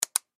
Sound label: Button Switch